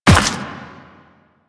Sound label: Run Dirt, footsteps